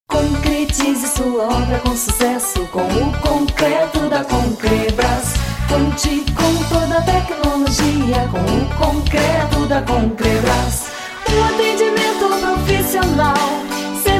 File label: Jingle